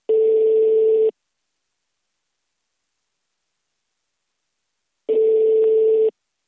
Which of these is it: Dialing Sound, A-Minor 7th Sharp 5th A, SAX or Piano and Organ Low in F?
Dialing Sound